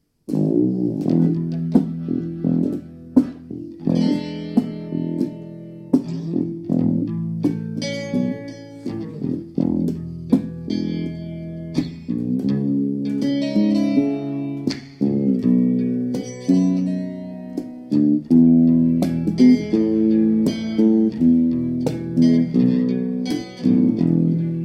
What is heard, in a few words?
fast train